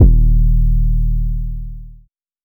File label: 808 D#; Cymatics